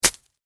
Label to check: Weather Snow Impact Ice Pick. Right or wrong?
Right